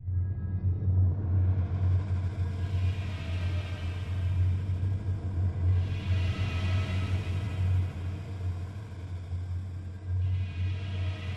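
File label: ambience processing plant; Half Life Alyx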